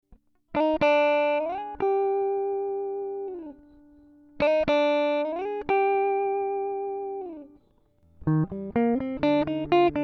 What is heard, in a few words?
Cm124 Funky Groove